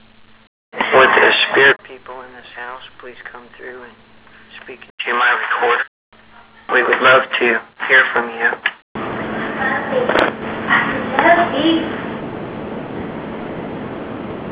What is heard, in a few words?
children reply